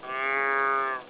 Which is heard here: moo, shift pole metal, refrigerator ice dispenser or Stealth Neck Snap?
moo